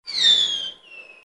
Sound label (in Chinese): 穿天猴发射; 武器音效